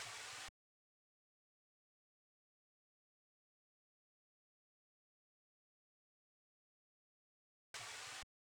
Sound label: Drum Loop 124 BPM Noise; Cymatics; Vibes II Drum Loop Collection; Aftermath